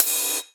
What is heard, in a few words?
Ride, Cymbals